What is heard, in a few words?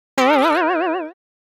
Slide Guitar Comic Springing Vibration Fast; Hollywood Edge; sound designer's toolkit v2